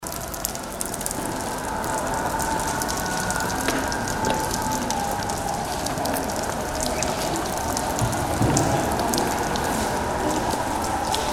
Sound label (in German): Regen mit Muezzin